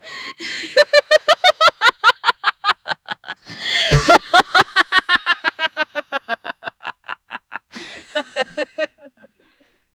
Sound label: dont laugh 4 women; voices